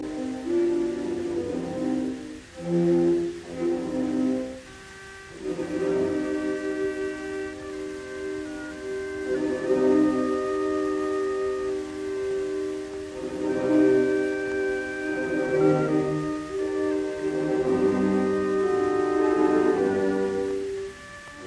Symphony in E flat major Marcia funebre Adagio assai; Beethoven; Op 55; Eroica; Oskar Fried